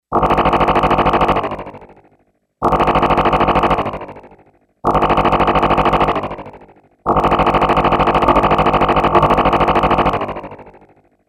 RADIATION, Yamaha DX11; Factory Preset Voices

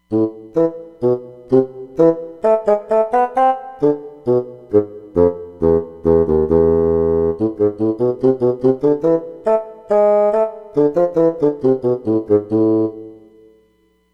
Bassoon; Roland Fantom XR

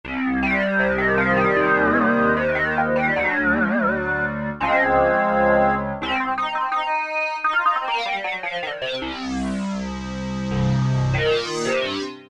Ld, E-mu Morpheus; JXTouch

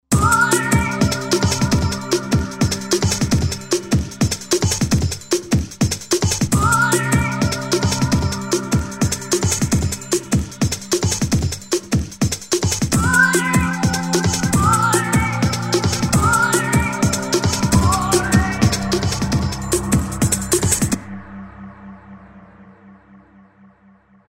150 Ohyea; Roland SR-JV80-12 Hip Hop Collection

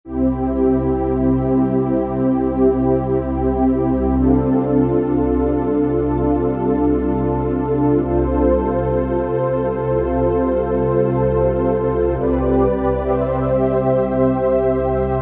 Pad the Pad, Roland V-Synth; Factory Patches

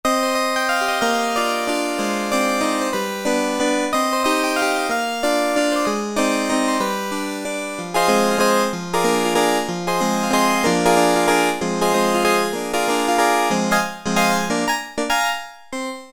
Key Vintage Piano, Alesis Micron; Factory Programs

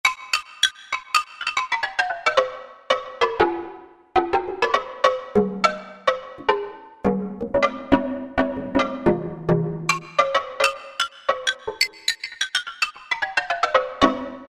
Bambu, Yamaha TG500

